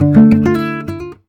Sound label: D MIN 7TH, Nylon Guitar